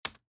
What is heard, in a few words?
key